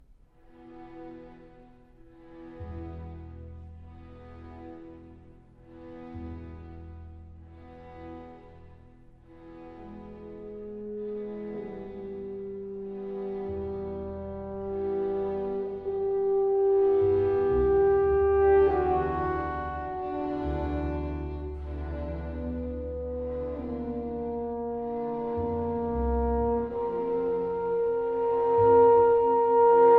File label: Serenade for Horn, Tenor & Strings, Elegy